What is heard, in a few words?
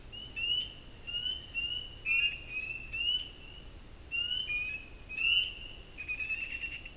Creature Calls